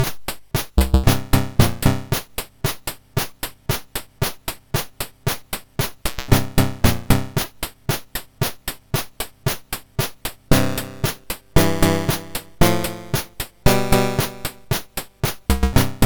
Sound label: sid, MOS6581R4; Classic Concentration